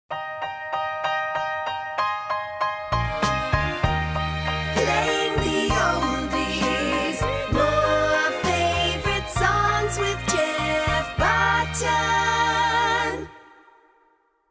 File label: Jinglebells